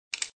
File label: key oneshot; Bob the Robber 2